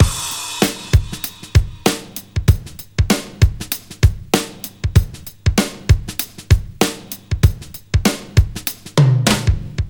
ac drumloop ny parallel big limiting

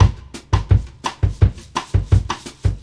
bossa drum loop dry

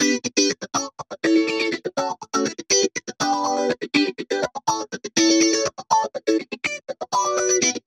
guit talker

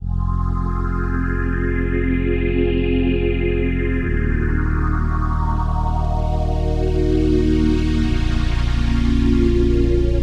stringmachine like wet

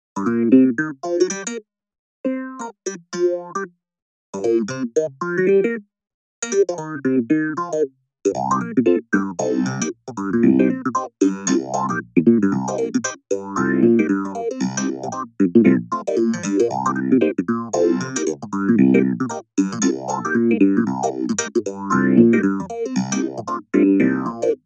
clav orig

